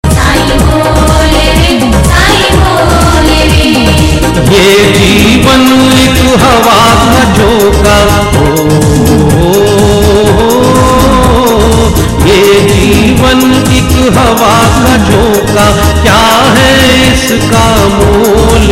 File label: ringtone